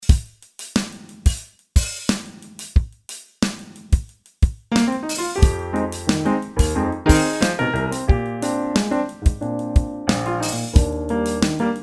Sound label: piano